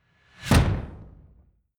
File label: incoming flutter echo